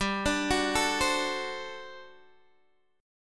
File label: G Major 7th Suspended 2nd Suspended 4th G down Guitar Open D